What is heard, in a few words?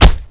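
crossbow hit body, weapons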